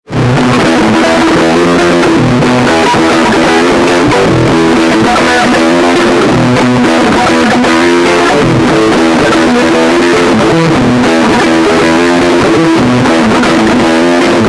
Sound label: guitar metal sample, bass guitar; cephisso